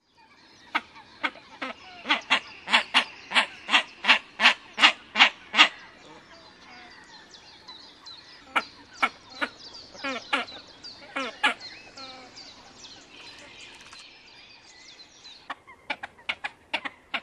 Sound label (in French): Grebe huppe, birds